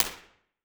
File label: highly damped large room; IR